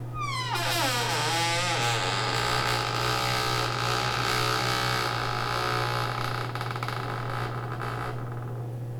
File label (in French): grincement porte